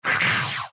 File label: gargoyle hit